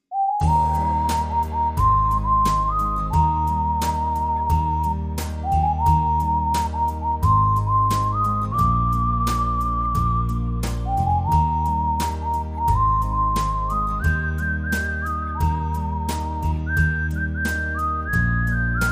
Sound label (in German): Butterfly Pfeifen